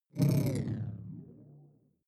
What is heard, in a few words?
sci-fi alien big bionics down; swooshes-swishes